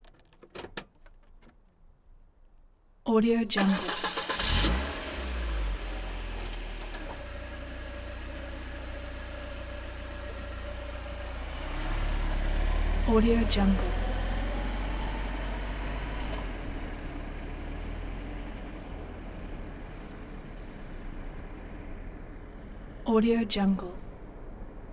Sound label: volvo start